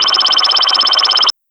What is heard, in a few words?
a watery sound of a, blip